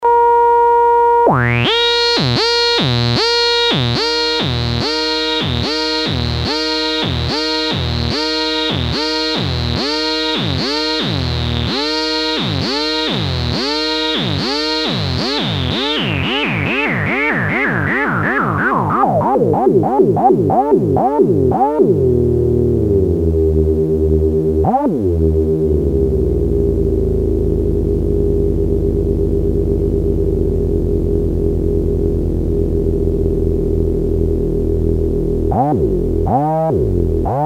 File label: glide osc mix, synths paia